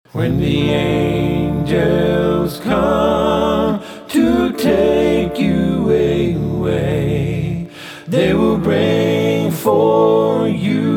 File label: acapella, gospel